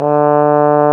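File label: brass, Trombone; Roland L-CDX-03 Disk 2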